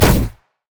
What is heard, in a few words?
great wraith basic attack; LoL; monsters